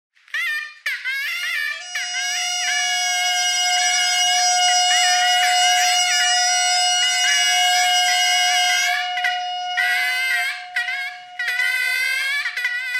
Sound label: bagpipe radio thread noise